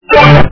new alert

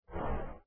Windows XP Minimize

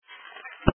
recycle

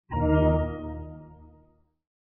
Windows XP Logoff Sound

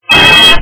ringin

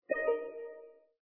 Windows XP Notify

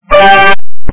new email

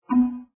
Windows XP Ding

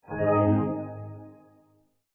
Windows XP Logon Sound

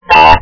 ringout

